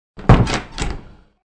Door Shut, Door shuts and door knob turns into position.; Door Closing